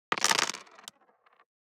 DICE on Plastic, Throw and Roll, Standard, 6 Six Dice; Samples; ArticulatedSounds; DICE Sfx Party (Extended)